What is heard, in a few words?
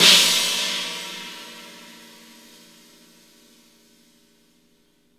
CYM CHINA, Cymbal; Roland L-CDX-01